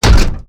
Robotic Footstep; SoundsCrate

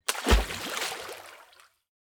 heavy splash; soundscrate

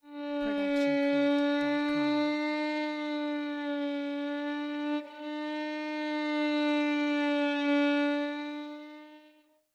Violin Bends Gliss

